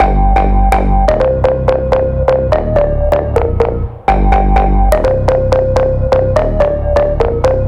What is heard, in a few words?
Big EDM Bass Loop G3; Future House; Future House Tools; Bass Loops